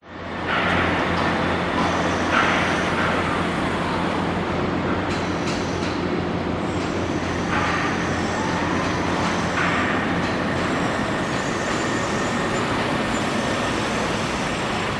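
public places city ambience busy traffic very close huge construction site across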